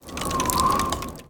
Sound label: Wizard Freeze